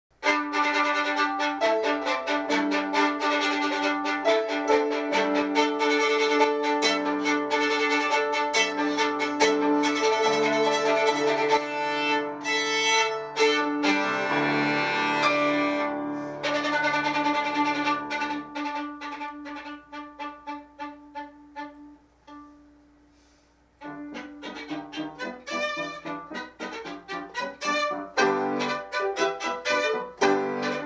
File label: Violin; Modest Mussorgsky; Hopak